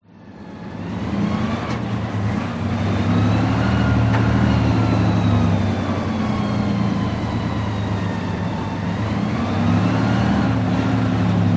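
warfare tank matilda mark 2 1939 drive across field to stop idle internal perspective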